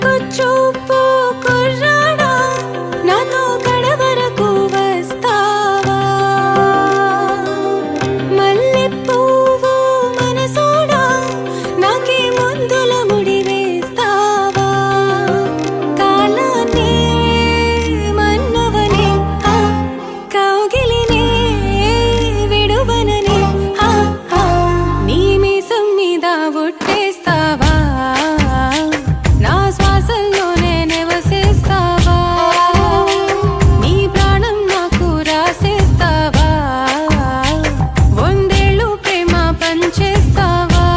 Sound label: TELUGU SONG RINGTONE